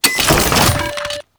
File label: Assassinate Key Deposit; Warframe; Nineteeen Ninety Nine